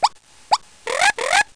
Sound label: cute Animal; Bible Fun Pack; Education Publishing Concepts; NOAH